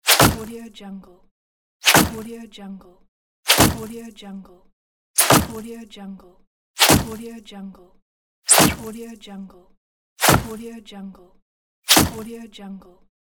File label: arrow hit